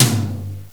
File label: Room Tom; Software Explosion 2 - Virtual Computer Pets; yamaha xg; drums